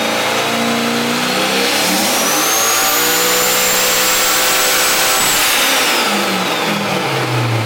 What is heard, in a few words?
Turbo Ls1